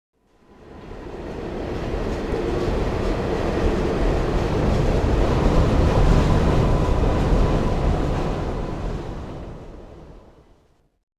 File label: subway move, subway train; ucsounds